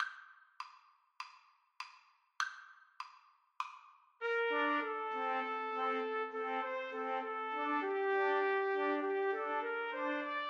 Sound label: idylle, Trumpet trio